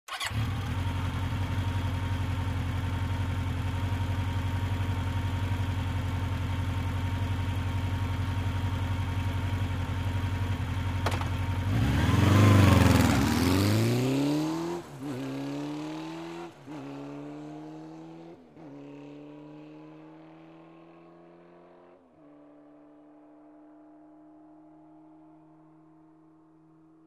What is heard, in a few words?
Motorcycle starts, waits, drives quickly